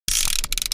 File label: ratcheting sound